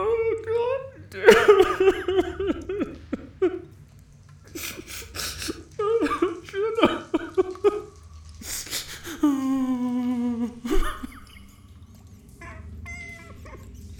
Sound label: weeping